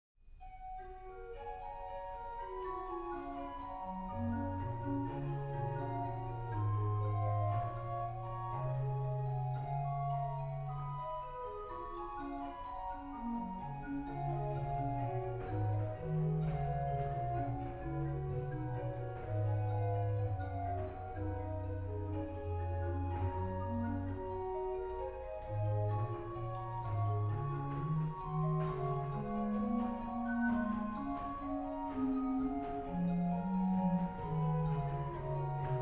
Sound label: Bach Organ Works; BWV 632; Herr Jesu Christ dich zu uns wend; Orgelbchlein